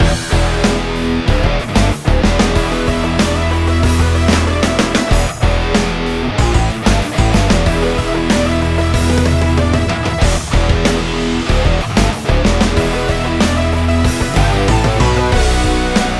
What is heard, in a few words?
Alt Menu; Jankbrain